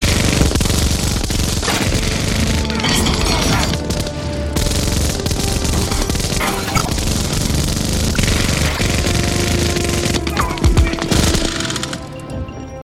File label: iphone machine gun ringtone